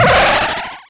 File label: Powerup